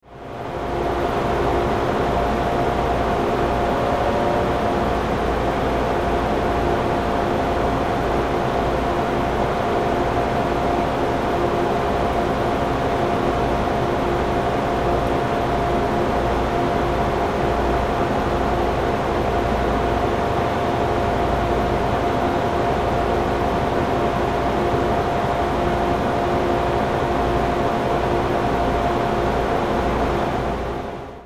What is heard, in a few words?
Subway Idle